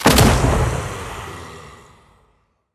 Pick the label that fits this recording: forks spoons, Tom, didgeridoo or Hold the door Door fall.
Hold the door Door fall